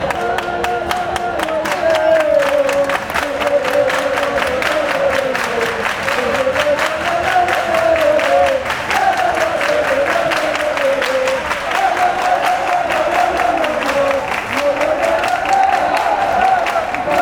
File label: palmas lo lo lo barroco fanchants